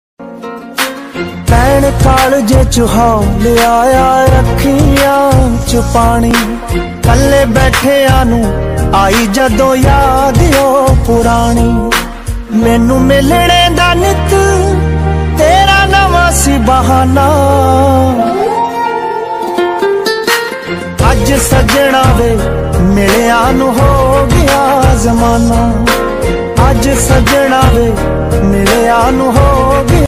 best punjabi ringtone song sad ringtone broken heart touching ringtone